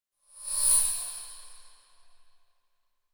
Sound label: Cymbal Metallic Whoosh By Thin And Fast High Pitch; Hollywood Edge; sound designer's toolkit v2